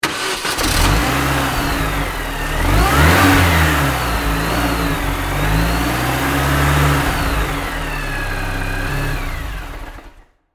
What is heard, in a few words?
transportation car start off external garage mini cooper bmw; Car Start Then Engine Off - Recorded Externally Of Car In Medium Sized Garage - Mini Coope; Cars (Sound Effects:Vehicles); McKinney Sound